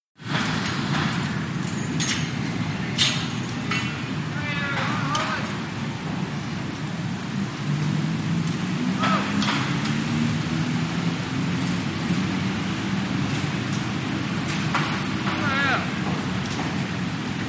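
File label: Ferry Crew Clang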